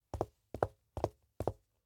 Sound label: Footsteps Pumps; LOOPERS